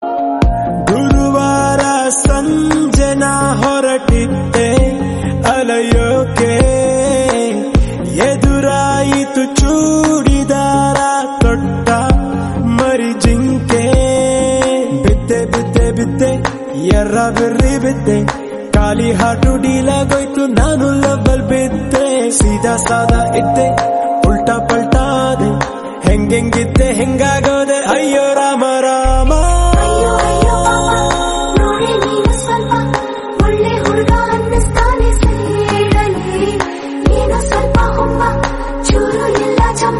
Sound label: kannada song